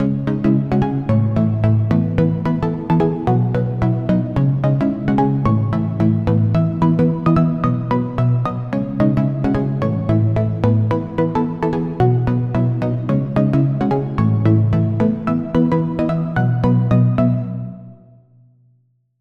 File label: Pluck; Routed Sound; Serum 2 Expansion - Star Scavenger Vol.1